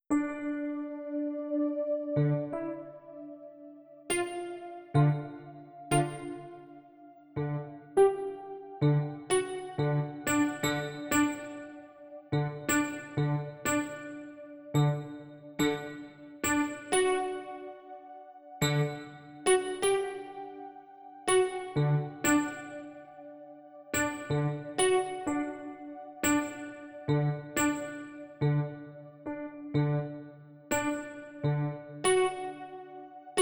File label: epiano